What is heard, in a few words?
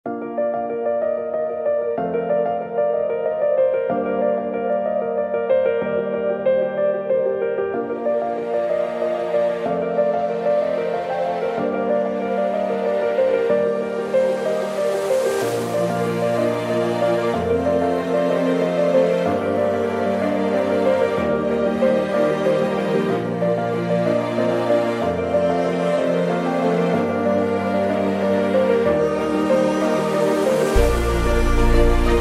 music